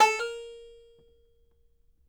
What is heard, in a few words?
BANJO NOTES, BB4; Sampleheads; Whole Lotta Country 1 & 2